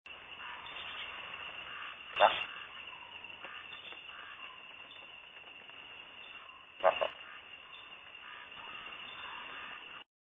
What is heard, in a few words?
Phyllomedusa tarsius